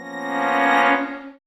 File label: Stinger; Ben 10 Protector of Earth; Wii